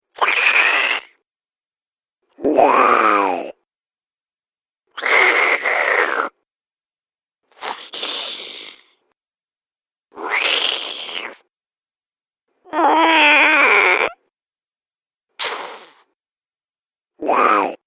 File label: Little Monster Soundpack; Monster Sound Effects